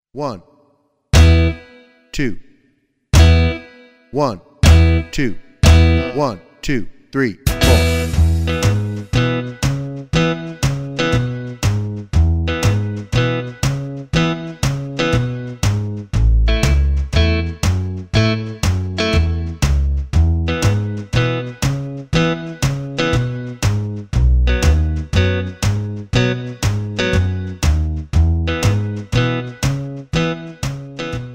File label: Wake up